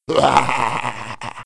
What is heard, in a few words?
Imp Runaway; Playable Tutorial Level